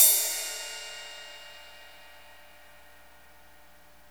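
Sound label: cymbal crash, Roland; Club-50 - Foundations Roland; Ext.Snare 4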